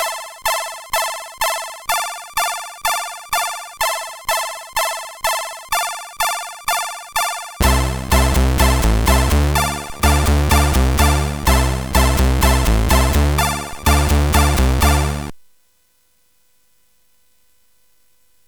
Magical Spell, CSG8580R5; HVSC

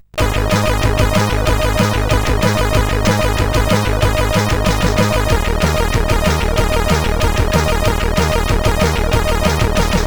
Basic tune, sid CSG8580R5

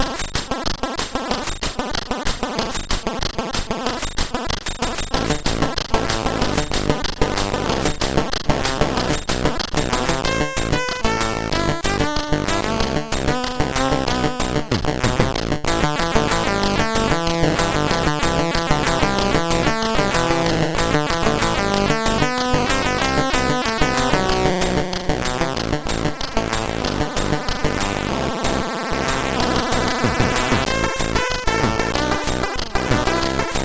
Indy Heat, CSG8580R5

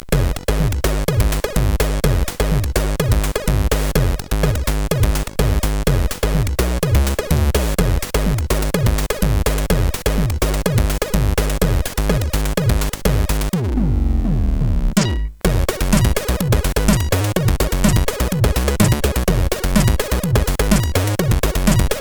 Kashanka Beat, CSG8580R5

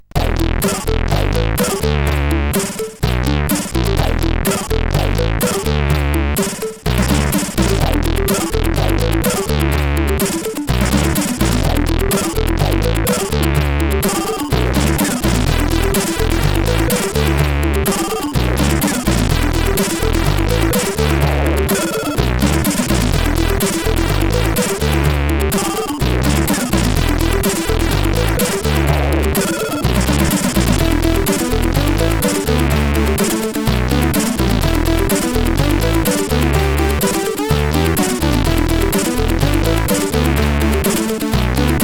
Funky Stuff CSG8580R5; SoedeSoft

